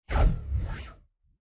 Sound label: Board Upgrade; subway surfers city sound effects; Android, iOS